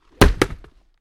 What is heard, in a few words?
Football Helmet Pla, football